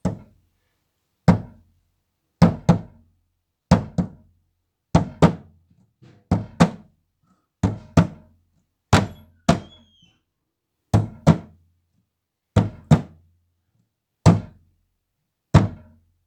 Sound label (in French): Bruitages bois étouffé; Percu Bois